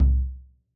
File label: bassdrum, gretsch kit